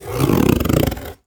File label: scratch box